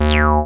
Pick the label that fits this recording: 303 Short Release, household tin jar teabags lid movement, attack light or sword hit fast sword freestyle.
303 Short Release